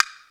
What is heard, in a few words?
BLOCK, percussion; drum